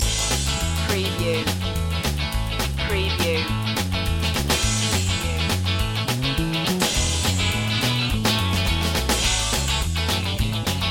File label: alligator stomp loop